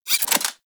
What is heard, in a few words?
Pistol Mech; Warframe; Tenno; Sybaris Pistol